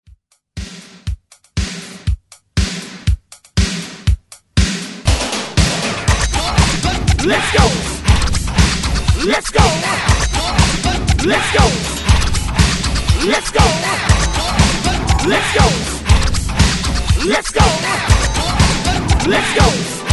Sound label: 80s Hype ReDrum 120 Bpm